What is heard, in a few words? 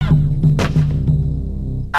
death; singe